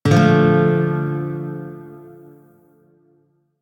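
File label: Shawzin Major Chord B, Music; DE; Warframe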